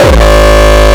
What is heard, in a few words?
125 c sharp rlly nice kick